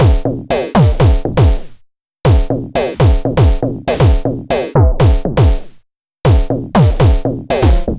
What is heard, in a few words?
Electronica Drumloop; Drums